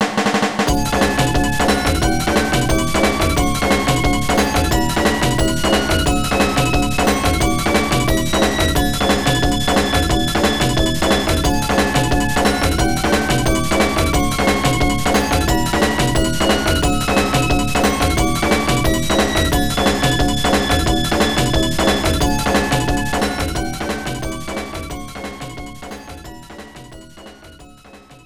Samba; Soldam; Sekiero Mode Extra

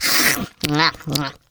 China Click Sound; Jolly Battle; Android

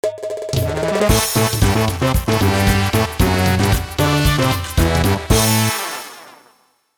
Drum Intro; Mario Party 3; N64; Bang Out a Drum Intro

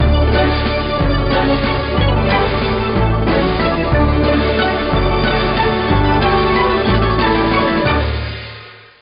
Ending; Manic Miner; GBA

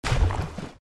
Fall In Ink

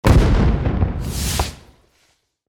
firework; teardown; ps5 windows xbox series xs

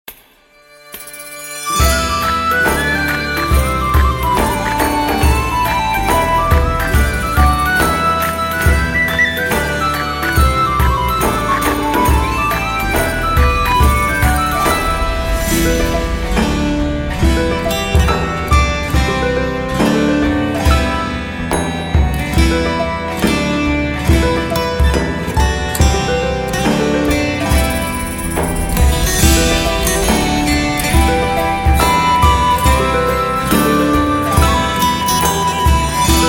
Medieval play; Shadow Legend VR

